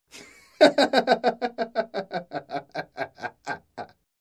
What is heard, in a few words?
Villain Laugh